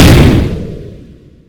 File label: explosion; stk